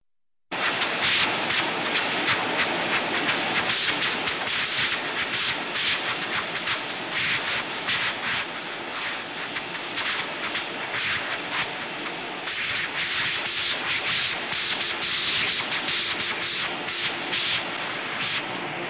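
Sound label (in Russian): звук пылесоса засасывание в сопло трубы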